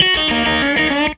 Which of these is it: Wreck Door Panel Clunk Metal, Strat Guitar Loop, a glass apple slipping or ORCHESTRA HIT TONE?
Strat Guitar Loop